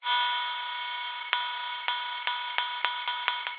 science fiction radio static interference crackle hum with clicks